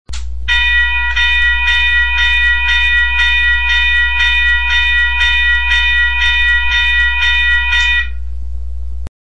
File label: Bells Electronic